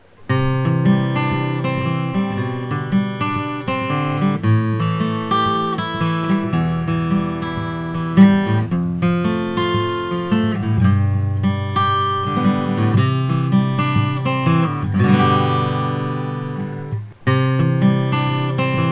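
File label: 2011 martin custom artist, guitar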